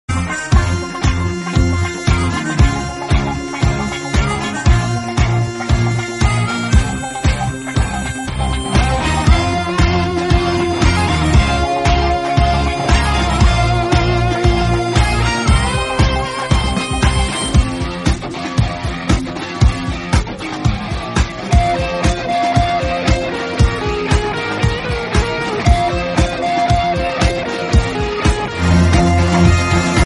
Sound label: theme instrumental bgm; sooryavansham